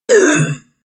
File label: pain